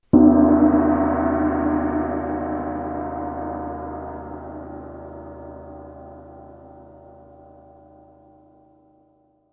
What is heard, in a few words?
Gong deep